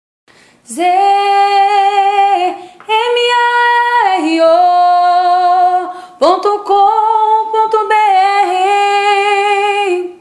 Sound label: ringtone